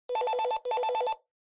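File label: Handheld Transceiver Signal Receive Call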